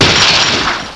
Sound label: Shatter Glass; QuickShot v1.0